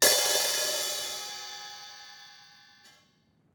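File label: open hi-hat; eddyflux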